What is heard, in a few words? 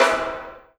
MP SD2